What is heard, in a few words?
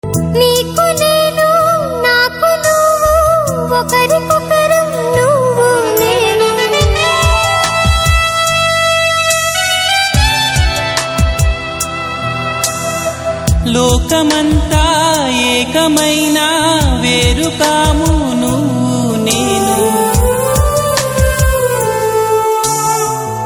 Female Ringtone